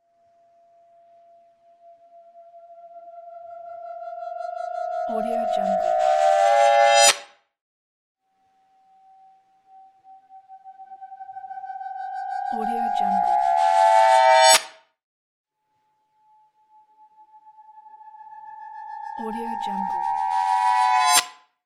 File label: reverse noise